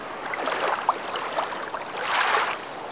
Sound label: water